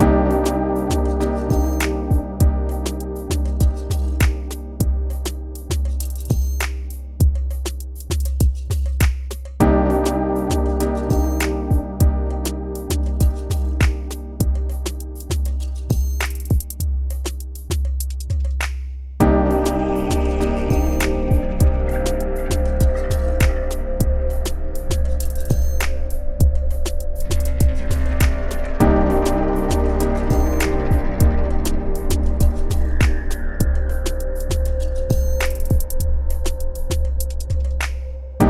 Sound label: orange sky loop